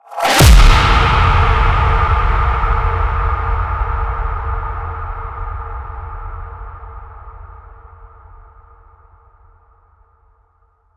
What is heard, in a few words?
impact purgatory; Virtual Riot; Impacts